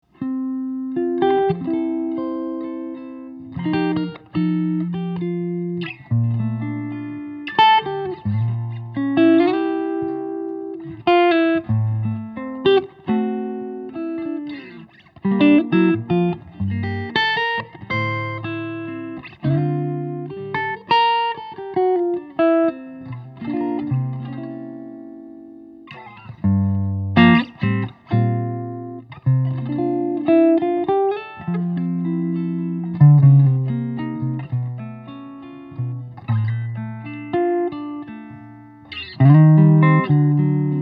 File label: guitars, archtop guitars, electric; dambrosio; prelude; old flat sawn figured maple; sitka spruce